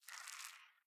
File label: Egg Shake; The Inn